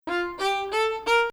Bayati Ajam 6, Maqam Bayati; 2nd Ajam 3/6

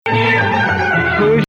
Nawa Athar Gameel Rast; Maqam Nawa Athar

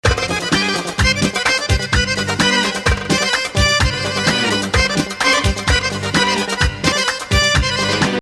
Hijaz

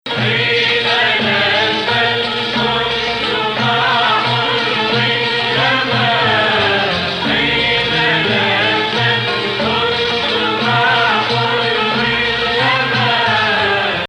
Sikah Jadaka 2nd Rast

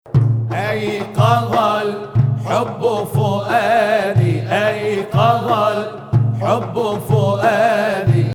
Ajam Nahawand

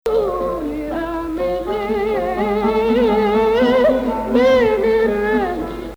Hijaz

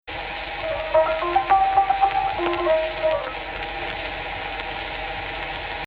AHH, Egypt Rast

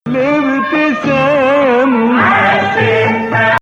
Nawa Bayati; Maqam Nawa Athar; Bayati 5

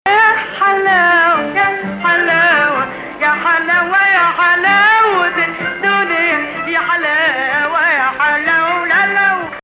Ajam

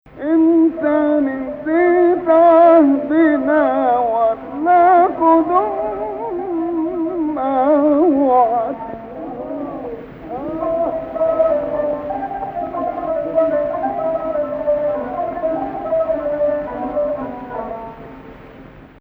Rast Octave

